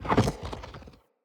Fire Rain Ground Rupture; Powers; Fenn

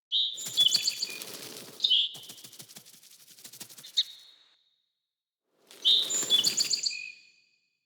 Sparrow Landing Fly Off; Dendria; Envoy

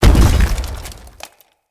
Blunt Heavy Impact Wood, Weapon Impacts; Player; Environment

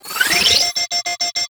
Shield Lancer Pistol Alt Charge; Warframe; Lasrian Soldiers; Weapons

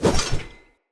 Short Sword Whoosh Heavy; Weapons; Enemy; Swords; Short Sword Elite

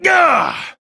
Exertion; Warframe; Entrati Lab Quest; 2023 TennoCon; Arthur

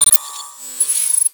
Computers Random; Warframe; Grineer; Computer Room

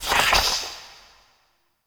Shot Mech; Warframe; Noctua; Weapons

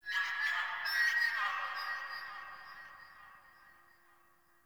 Texture Interior Medium, Ambience; Warframe; Sentient Lair

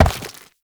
Step Goo; Warframe; Uriel

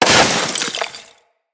Melee Impact Snow, Weapons

